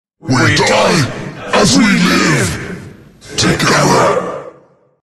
jak death; jakiro

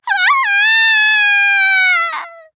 Falling; Maeve; Paladins

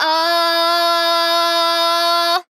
Death Fall; Ying

